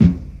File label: 90s reggae tom drum single hit f key, Royality free tom sample tuned to the F note; tom drum samples